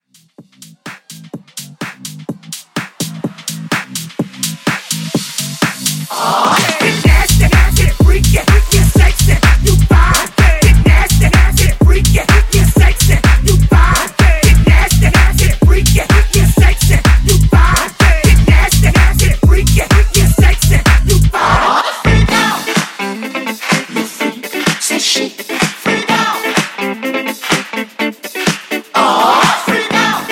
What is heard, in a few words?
House Mixshow, 126